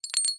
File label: Browse Skin; subway surfers city sound effects; Android, iOS